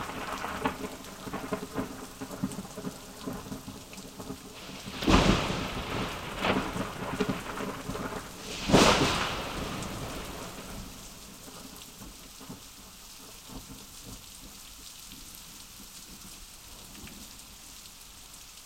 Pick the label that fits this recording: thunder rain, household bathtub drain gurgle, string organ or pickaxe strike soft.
thunder rain